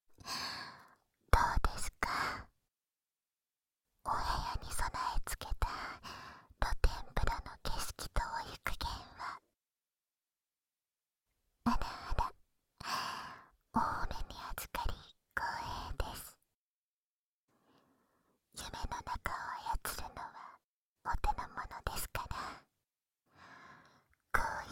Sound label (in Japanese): 浴槽で潜望鏡フェラ 簡易モノラル 効果音なし